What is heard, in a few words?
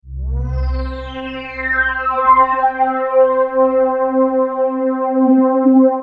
key, pads